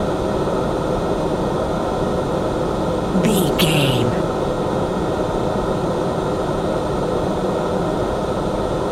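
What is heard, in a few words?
background air vent vacuum hum motor loop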